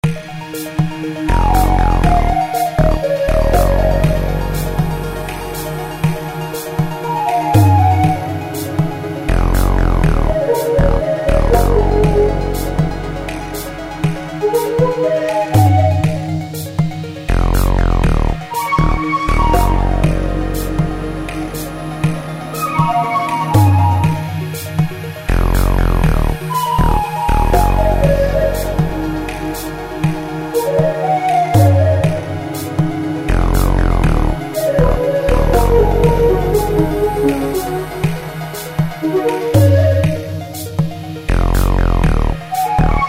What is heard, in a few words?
indian jazz